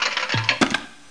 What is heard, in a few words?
food; cdromtoday; startrek